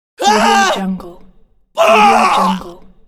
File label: male pain scream